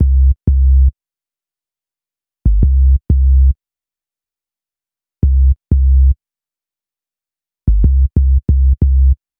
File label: Bass, Hip-Hop